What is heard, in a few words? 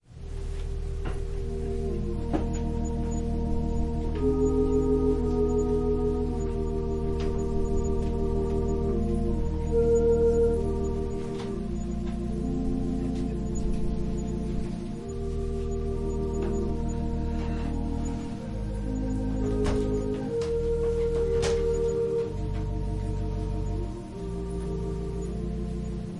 Organ prelude